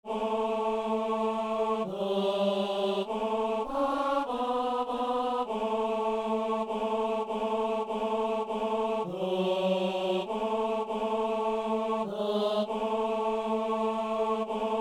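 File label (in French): ténor; Messe de Saint-Boniface - Agnus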